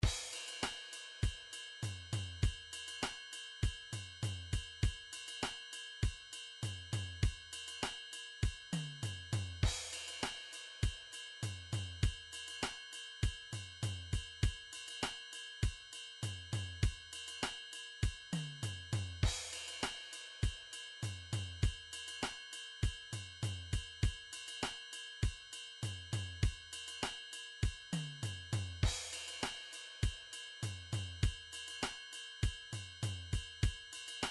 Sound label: midi drums, sixties